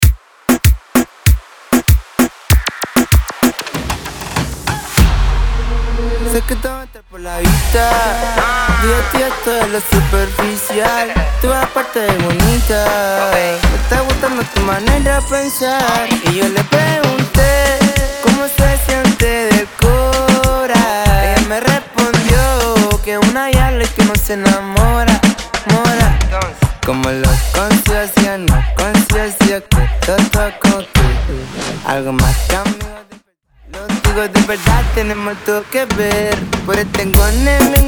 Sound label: Intro Acapella Outro 97 BPM